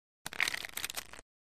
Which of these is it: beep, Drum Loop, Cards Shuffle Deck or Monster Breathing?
Cards Shuffle Deck